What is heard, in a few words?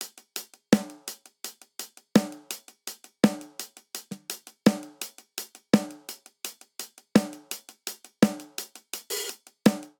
Straight Seven Rock Snare & Hihat 7/8 84 bpm; Ableton; Factory Packs; Drum Booth